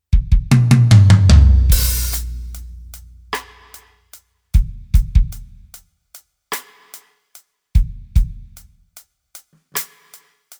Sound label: Set Ballad